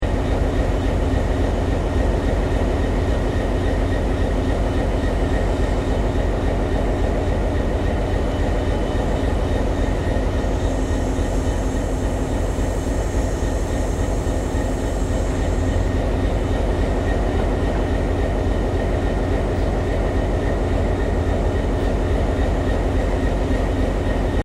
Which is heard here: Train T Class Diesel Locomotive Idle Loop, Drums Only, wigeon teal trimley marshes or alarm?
Train T Class Diesel Locomotive Idle Loop